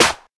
airy acoustic snare sound a key

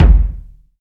wet kick single hit F sharp key

